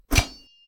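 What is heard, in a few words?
Weapon Generic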